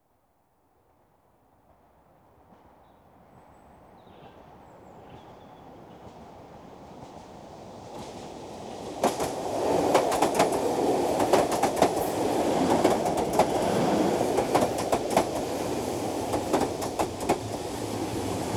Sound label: Electric Trains Train arrives; BBC Sound Effects Library